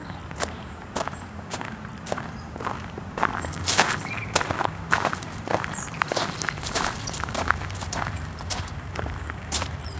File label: park